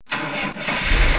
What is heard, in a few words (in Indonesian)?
suara mobil